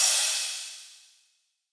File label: Crash, Cymbals; Cymatics; Dubstep Starter Pack; Drums - One Shots